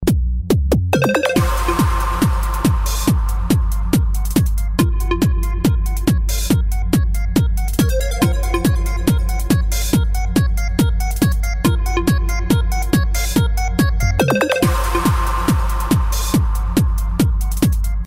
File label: Cloud crystals, ringtones; Doov A8